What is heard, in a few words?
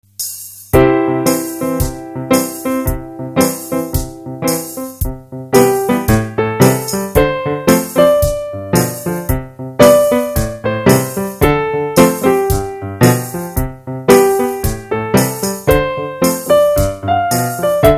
piano